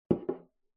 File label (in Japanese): グラスを置く音